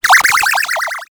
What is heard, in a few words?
Digital; Adobe; Multimedia Internet CD-Rom Flash